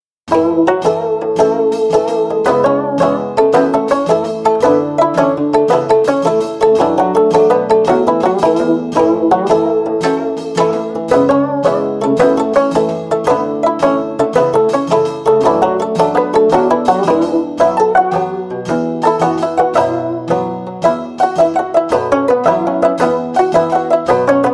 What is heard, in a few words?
gayageum